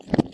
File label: jump